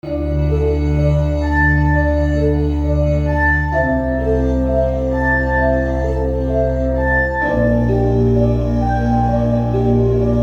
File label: Glass On Glass